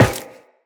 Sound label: Run Metal, Footsteps; Tenno